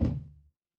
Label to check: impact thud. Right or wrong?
Right